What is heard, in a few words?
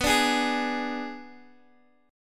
B-Minor 6th B down Guitar Open G